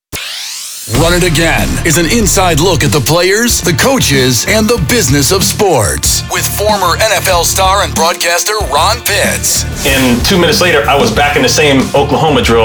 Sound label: Show Open With Trailing Bed; Run It Again